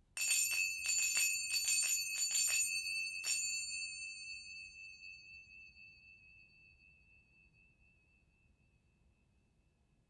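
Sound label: afghani bronze, zils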